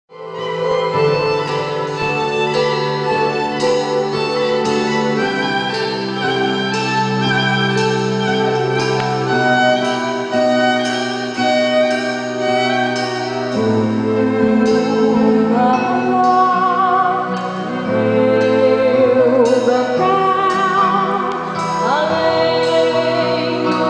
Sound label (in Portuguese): SOPRANO E ORQUESTRA